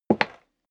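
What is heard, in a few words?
step leather shoes wood creak single left, footsteps; schritte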